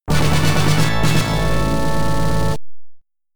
Tower Bonus, pinball; Big Guns; williams; arcade

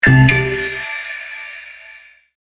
Success! (Medium)

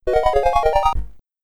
Item (SID Stereo), Commodore 64

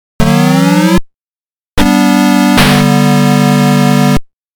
SOUNDS BIOS MASTER SYSTEM; SEGA; Story of the Bubble Bobble 2

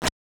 Dodging; Mike Tyson's Punch-Out

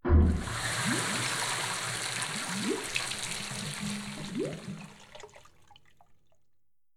pool empty; Kismet

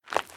Cave Crouch; The Inn

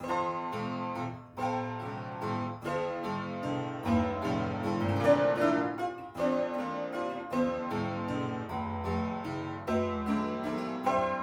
Intro Sequence Piano Loop; The Town

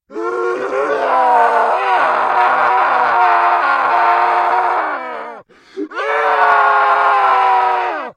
Scream

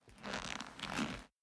Leather Foley; The Town

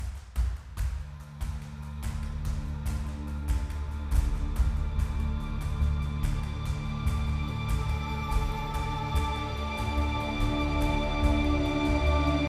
Snowstorm; Assassin's Creed III

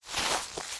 Footstep Grass

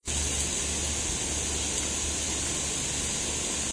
Water Spray

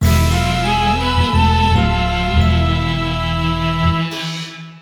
Music End Lose

